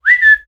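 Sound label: Whistle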